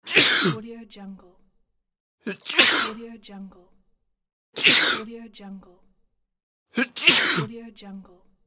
sneezing; عطسه کردن پشت سر

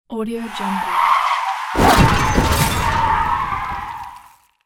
car crash; تصادف خودرو; urban sounds

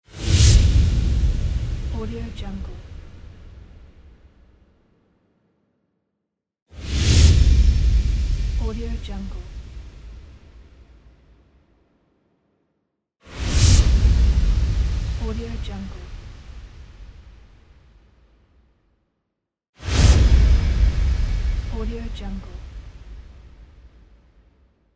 big whoosh; transitions and movement

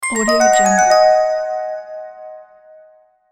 notification harp chime; interface sounds

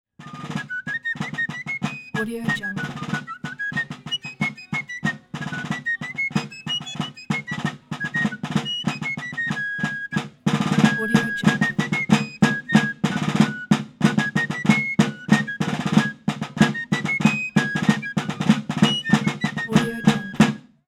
colonial american traditional fife drum march; transitions and movement

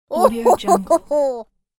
girl ribald laughter; human sounds

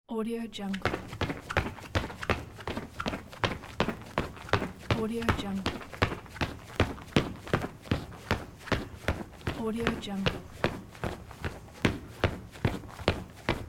running in sneakers on tiles; دویدن با کفش کتانی; human sounds

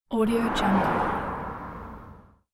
bike pass by; urban sounds

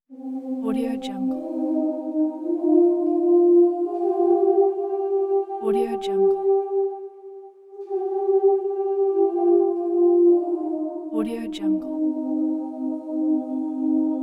haunted angels singing; transitions and movement; آواز خواندن فرشتگان

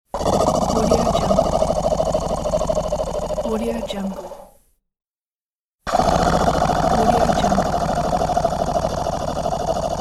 alien breathing; human sounds

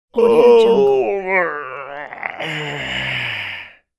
male wake up stretch yawn; خمیازه و کش و قوس مرد; human sounds

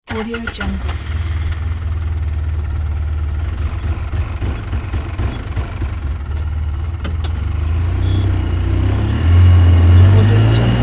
atv engine hum; urban sounds

